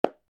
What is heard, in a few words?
clap; pyro